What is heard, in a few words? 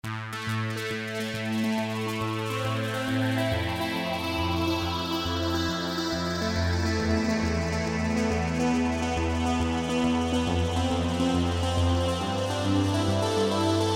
Accelerator Spacey